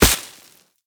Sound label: grass impact bullet; physics; surfaces